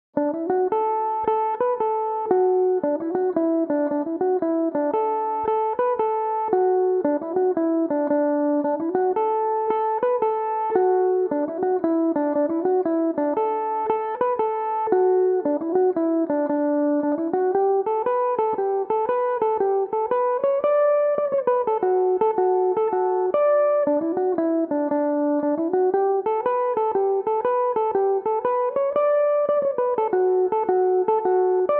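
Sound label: D major, irish mandolin, tunes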